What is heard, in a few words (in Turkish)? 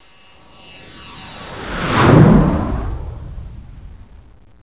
uçak geçiş; tasitlar